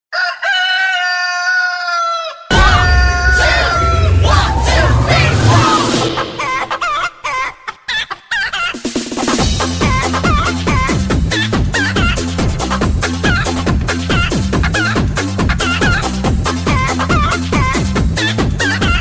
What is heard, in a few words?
MORNING Alarm